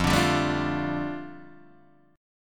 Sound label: F 6th Add 9th F down Guitar Open D, chord